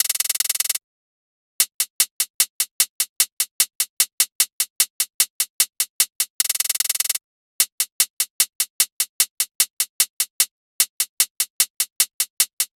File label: hats 150, Hat Loops; drum sample pack; Galeras; SEISMIC; Slate